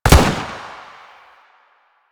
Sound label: Shield Lancer Pistol Shot 3D C; Warframe; Lasrian; Lasrian Soldiers; Weapons